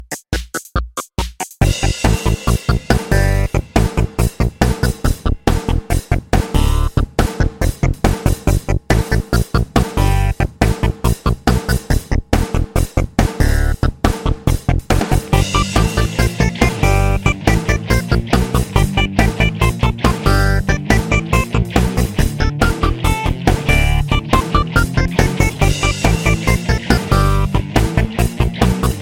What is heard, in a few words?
Game Dungeon